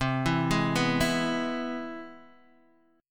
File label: C Augmented Major 7th, Guitar, Drop D